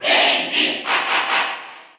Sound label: Cheer Russian; Daisy; SSBU